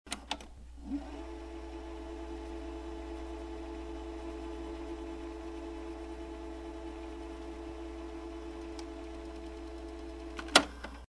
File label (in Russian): zvuk peremotki videokassety